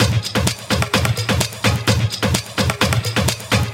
Percussive Loops 128BPM; Vengeance Samples

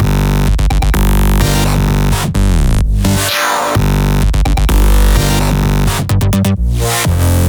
Silver Melody Root G, 128BPM; Vengeance Samples; Silver Kit

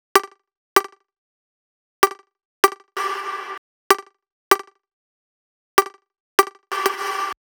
Hopper Lead Root G, 128BPM; Vengeance Samples; Hopper Kit

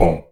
Synth Voc; Vengeance Samples; VEE Synth Shots

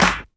Claps & Snares

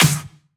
Snare; Vengeance Samples

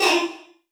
Vocal Oneshots; Vengeance Samples; Vocals

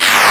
Sweeps Short

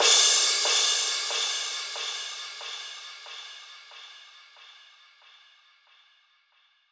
138 BPM Crash; Drums & Perc; Bminor

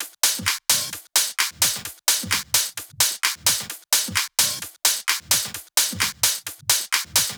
130BPM Kit; Vengeance Samples; Take Top Kit

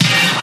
Synths A#; Vengeance Samples

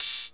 Hat Open

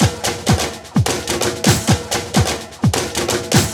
Latin House Loop 128 BPM; Vengeance Samples

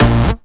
Synth Shot; Vengeance Samples

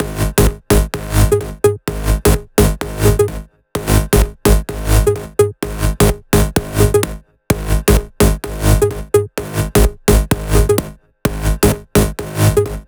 Melody Kits 128BPM Root G#; Vengeance Samples

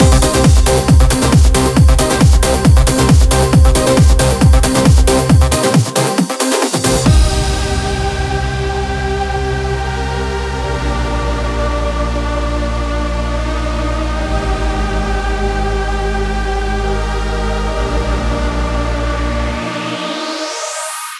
136BPM Mix

